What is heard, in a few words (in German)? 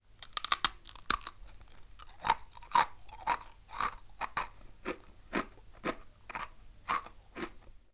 Abbeissen Knaeckebrot; Schneckchens Hörreise